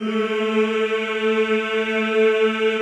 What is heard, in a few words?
CHO Yhh, Roland; Sound & Vision - Gigapack I CD 2 (Roland)